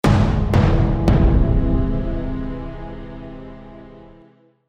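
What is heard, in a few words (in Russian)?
оркестр тревожный перезвон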